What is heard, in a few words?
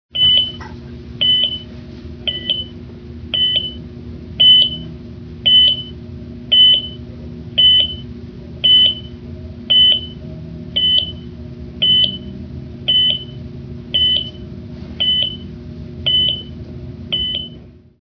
hearbeat hospital